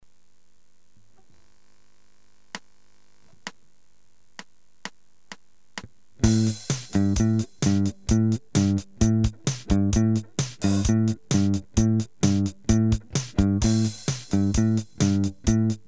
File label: Bell Bassy Riffs 130; Rhythm